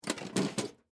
Lunch Box Pick Up, Foley, Lunch, Box, Pick, Up, Metal, Case; BlastWave